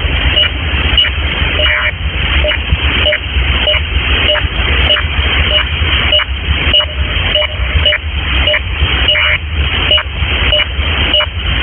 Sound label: link-11 clew dsb